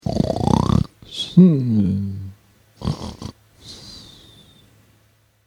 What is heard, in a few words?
Sleeping Man Moaning Snoring And Snorting